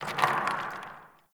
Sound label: Cave Rock; Ambience; Caves; Glades Of Andurin